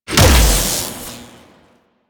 Shocker Explosion; Spec Ops Shocker

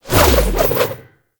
Dodge Side; Enemies; Abilities; Warframe; Tau; Dax Ninja

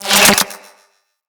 Melee Pet Projectile Return; Sentinel

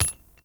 Flak Cannon Shell Impact; Warframe; Weapons; UT Weapons

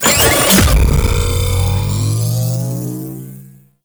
UFO Attack Jump Up; Warframe; Corpus; Jackal Redux; Abilities

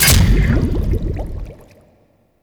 Maw Fishing Attack Impact; Warframe; Duviri

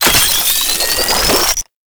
Pistol Alt Fire Charge; Weapons; Scaldra

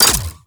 Flak Cannon Mech; Warframe; Railjack; RailJack Weapons

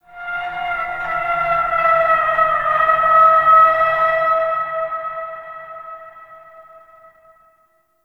Metal Bend, Ambience; Warframe; The New War; Zariman

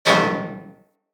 Bullet Impact Debris Pipe; Weapons

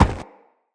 Crewman Footstep Run; Characters; Corpus; Crewmen; Footsteps

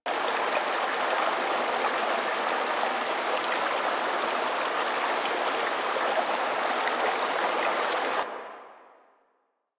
Bubbling Water Stereo Loop; Ambience; Props; Warframe; Nineteen Ninety Nine

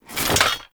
Generic Foley; Warframe; Warframes; Hoplite

